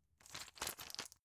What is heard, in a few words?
plastic blister handle